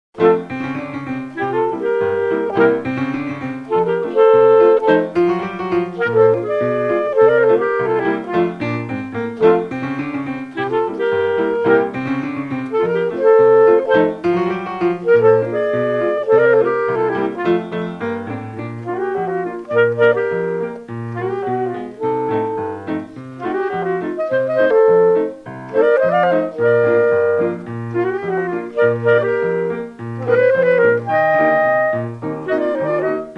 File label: blue duck rag